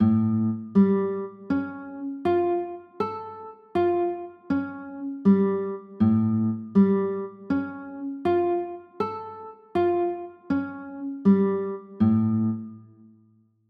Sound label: a sharp 6 9, chords